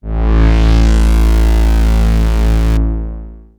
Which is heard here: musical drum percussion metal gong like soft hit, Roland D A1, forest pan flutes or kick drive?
Roland D A1